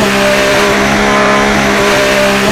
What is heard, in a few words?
Ferrari in; AOS F1 2022